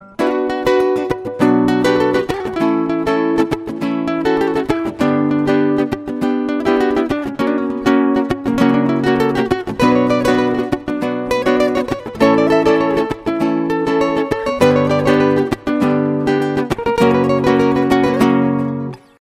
guitar spanish ringtone

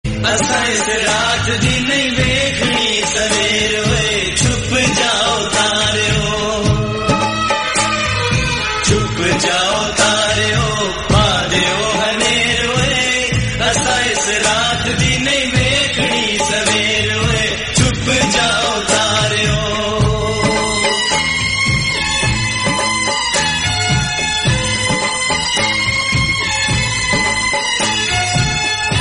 sad broken heart ringtone; bollywood

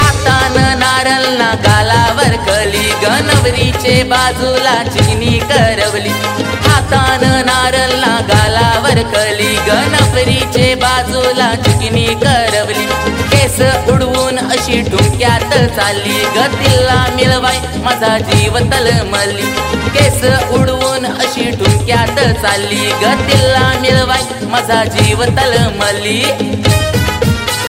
marathi song ringtone

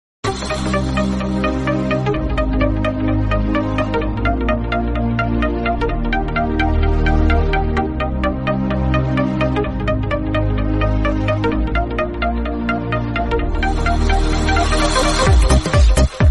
famous phone ringtone; iphone

